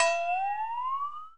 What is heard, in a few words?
dwee, cartoon